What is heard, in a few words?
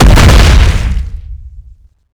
attack down; morbus